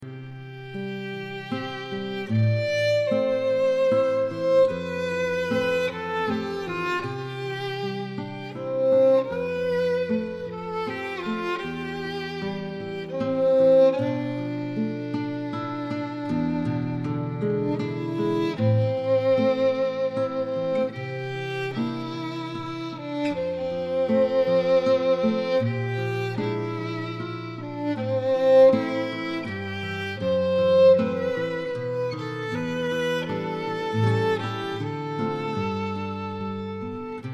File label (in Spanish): canción interpretada por guitarra y violin